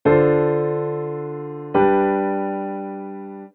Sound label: IIm V